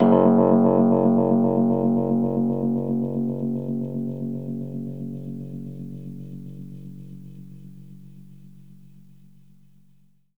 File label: RHODES F#, R; 3D Sound; 3D Keys VOL-1